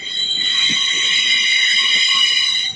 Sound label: subway sound; Subway Sound Construction Kit